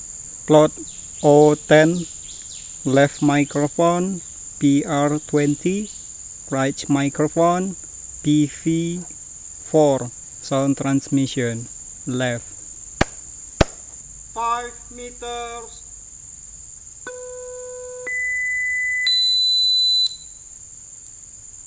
birds

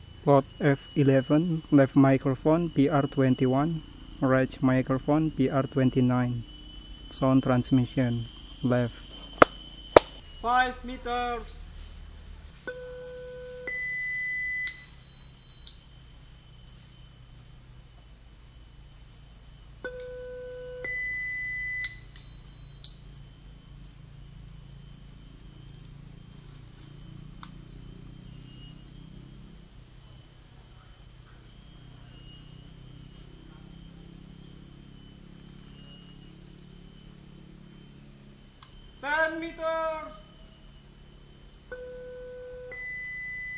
birds

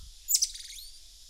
illigeri, Saguinus illigeri

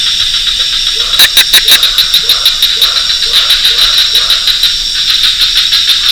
Amnirana nicobariensis

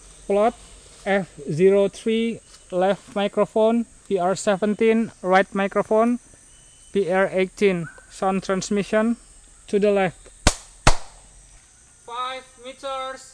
birds

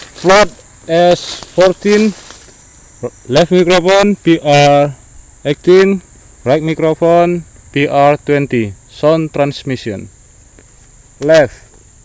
birds

